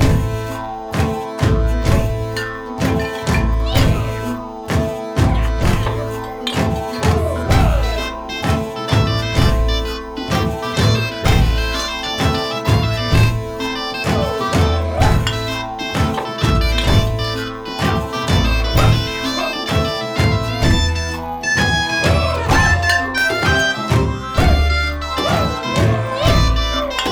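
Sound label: free spins lucky mug loop; netent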